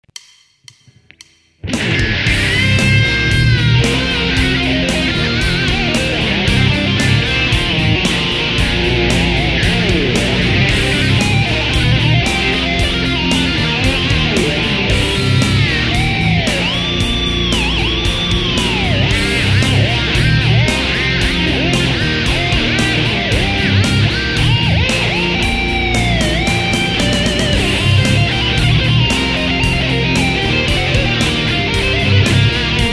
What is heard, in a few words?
improvisation